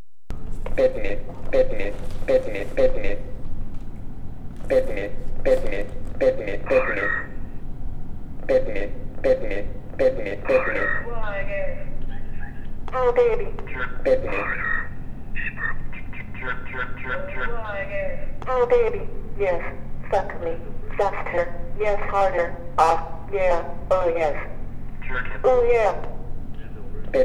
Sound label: telephones